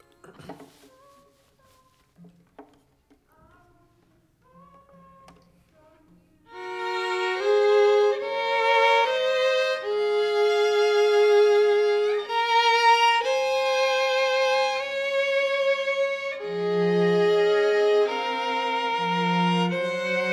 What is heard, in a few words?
violin